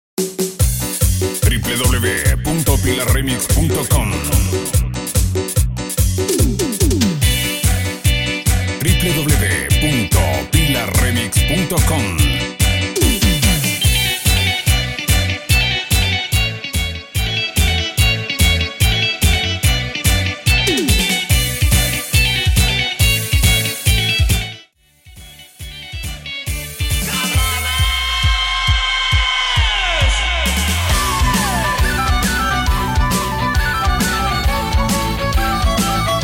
ESTILO ROCK, Chicha to Rock, 145 135 Bpm

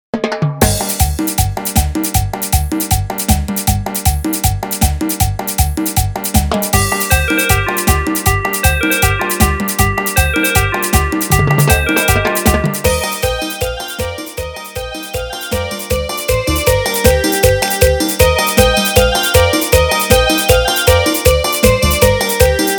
Imstrumental Ec, BPM 157

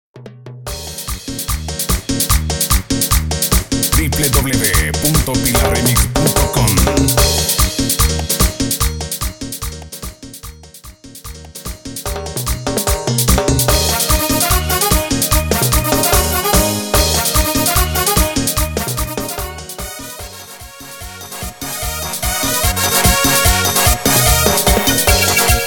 Instrumental sin Animacion

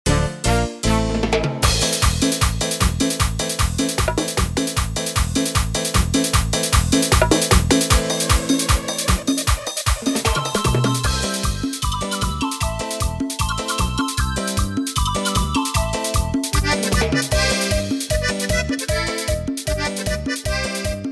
Instrumental Chichero Mosaico 153 BPM

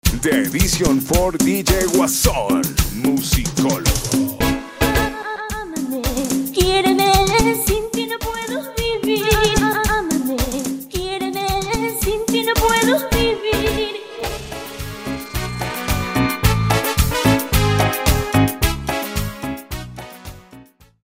Chicha Clasica 109 BPM

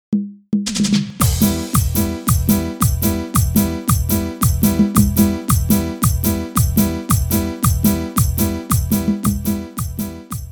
Intro Simple Bpm 112

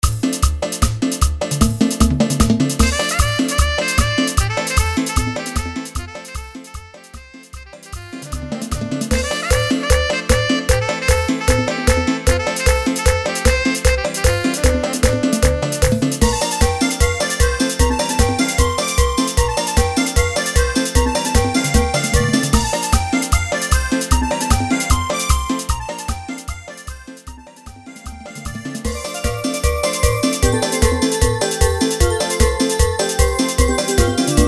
Instrumental Bpm 152

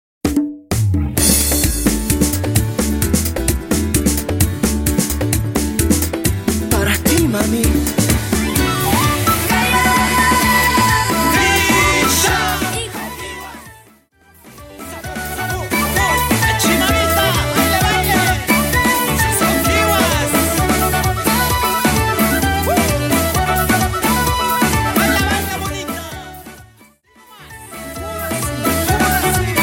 Intro Simple 130 Bpm

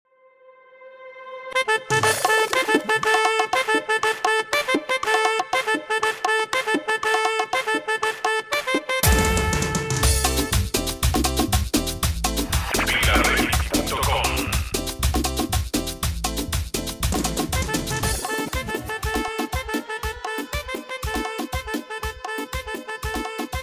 Intro Saxo, 120 BPM

